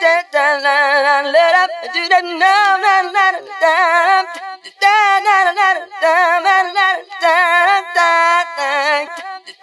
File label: voice female skats; Loops; Phonky